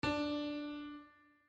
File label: pianino RE